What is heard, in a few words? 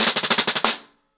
Snare 90BPM, ACOUSTIC DRUMS; Sonokinetic - The Roots Drumloops